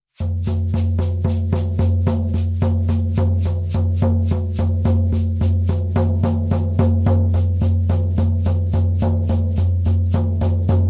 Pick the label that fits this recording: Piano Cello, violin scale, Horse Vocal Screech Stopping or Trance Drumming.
Trance Drumming